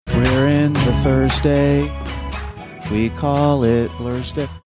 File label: singing we're in the thursday